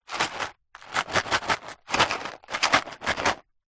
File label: industrial timber screws box 16mm shake